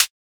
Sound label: hat one shot mid broad